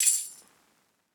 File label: tamb; eddyflux